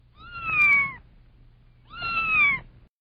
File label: kitten